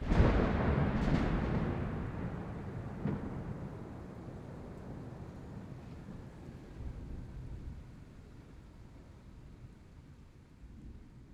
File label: Medium Thunder Clap; Mid-Side